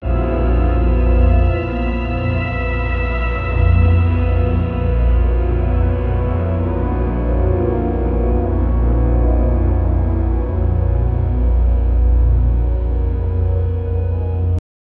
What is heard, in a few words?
dark brooding cinematic; sound effect